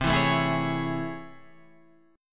A Major 7th C; strummed; JGuitar

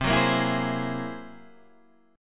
F sharp 9th Flat 5th, C

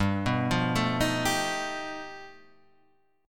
G Augmented 9th

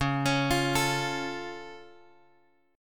C sharp Augmented, guitar chord; C#+ Chord {X 4 X 6 6 5} arpeggiated

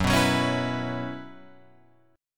Gb 7th Suspended 4th, Guitar Open F; Gb7sus4; strummed; JGuitar

